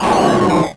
pinky pain, monsters vocalizations; DoomRTX

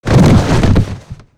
fall; Quake 4 Alpha; strogg tik

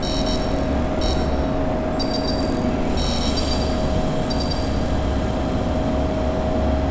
computer station, ambience; Quake 4 Alpha

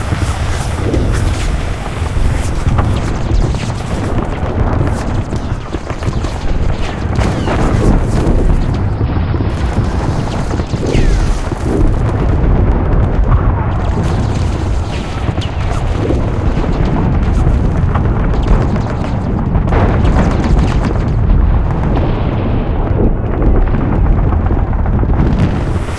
close battle loop, ambience; Quake 4 Alpha

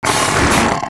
imp attack melee, monsters; Doom

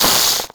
imp chatter combat, monsters; Doom

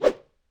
Imp Attack Whoosh, Melee Whooshes; Foley

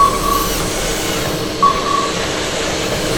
turret scan; Quake 4 Alpha; id software; weapons; secturret

